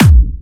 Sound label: Clubby Kicks, Bassdrums